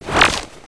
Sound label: nanosuit menu